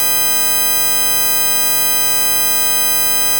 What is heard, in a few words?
multitone IRIAA; linearaudio